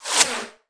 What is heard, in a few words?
close